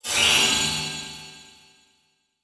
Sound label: Transition Metallic Blast; Production Element